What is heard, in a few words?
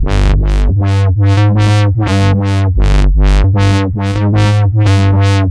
Yark Bass, Drum n Bass; KJ Sawka; Drum n Bass & Beyond